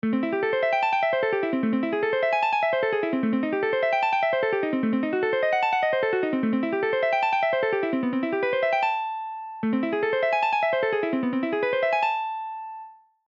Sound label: Sweep Leggato arpeggios; Dobles Santiago